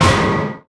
TRAP

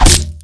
BEATTACK

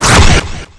DEFENDER GUARD IMPACT

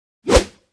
ATTACK JUMP

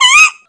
BE ATTACKED

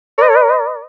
emoticon

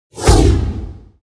JUMP ATTACK

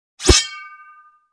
JUMP ATTACK

